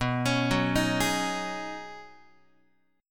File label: B 6th Add 9th B down Guitar; B6add9 arpeggiated; 4A,4E,5A,5D,5G#,6C#; chord shape; JGuitar